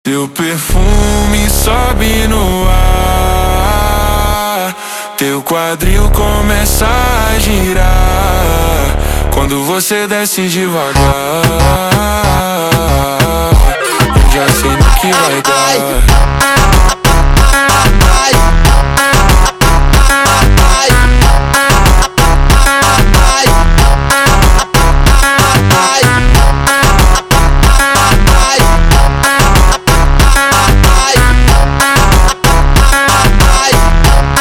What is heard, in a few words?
slowed, funk